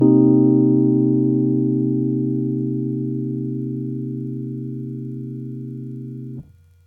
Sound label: C minor7